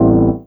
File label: CHORD, 160 BPM, Drum & Bass